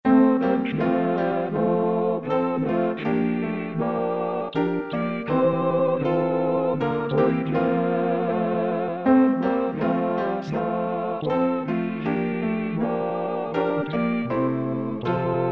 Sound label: organo satb